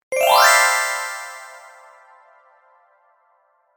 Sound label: kirarin